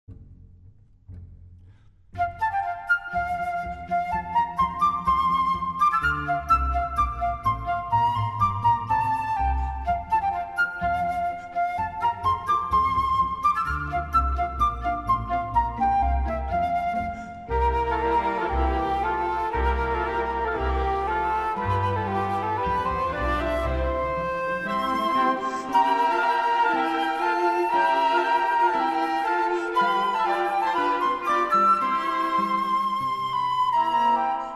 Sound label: Tambourin